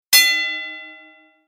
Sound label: starting gong